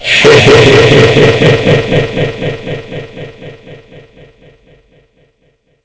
laugh left

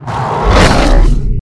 claw; diablo

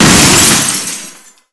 startle; ambience

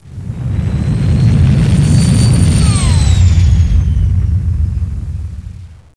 flyby

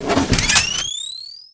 plasma mag in charge

